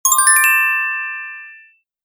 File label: twinkle